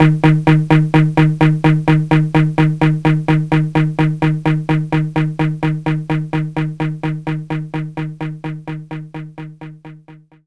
guitar mute

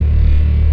forcefield loop; card forge

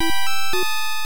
Failure; Nobunaga's Ambition; NES

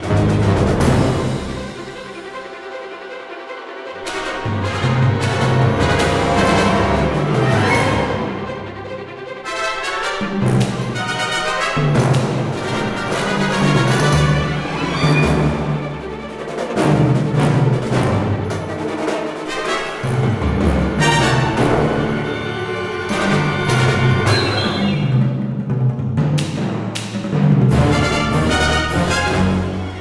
Action Loop; The Adventures of Tintin: The Secret of the Unicorn

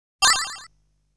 $ Acquired sound; legend of hero tonma

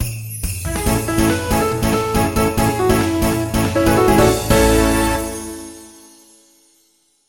Victory!; Nozomi; Grand Dad Mania Revived

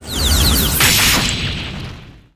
Alchemist Spread Slash; Se Skill

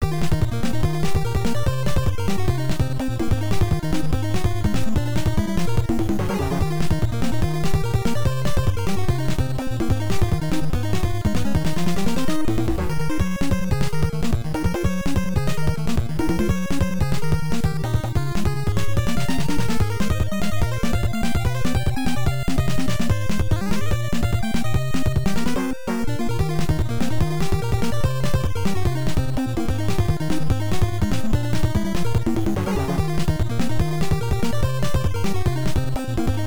Power-Up BGM; TwinBee 3: Poko Poko Daimaou; Family Computer

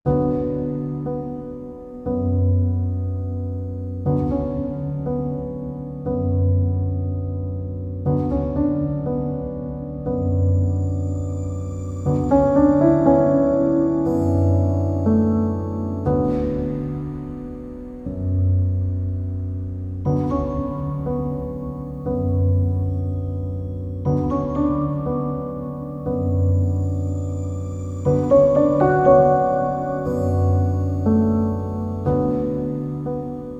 Intro Scene Loop; Timelie

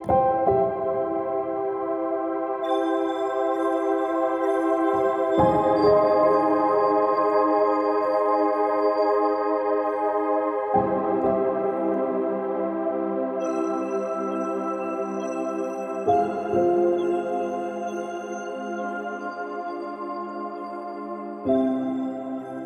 67BPM; TARDISDoctorHologram

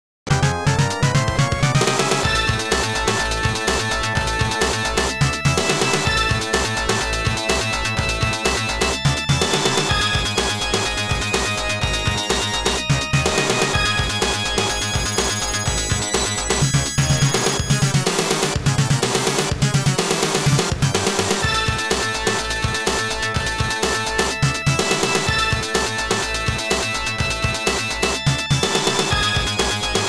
Fire Dragon 第7ステージbgm

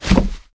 Footsteps

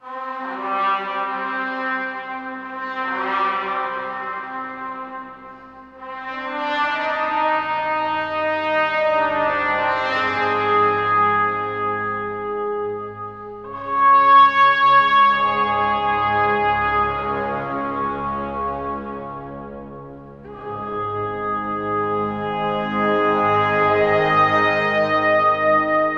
Alliance Fanfare; World of Warcraft; The Burning Crusade

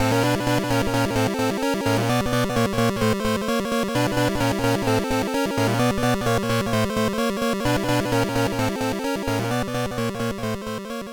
Rain Shower - Miss; Game & Watch Gallery 4; Game & Watch Gallery Advance; Modern Mode

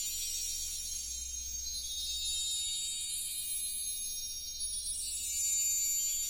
positive small loop; gamerip; Healers Quest

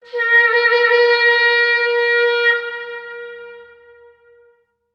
Horn; chivalry medieval warfare 2012 pc

